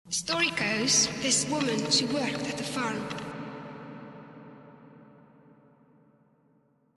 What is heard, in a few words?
spatialization